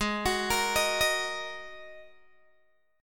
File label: Ab 7th Suspended 2nd down Guitar Open G; arpeggiated; Ab7sus2 Chord